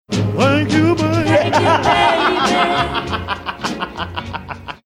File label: singing thank you baby mistimed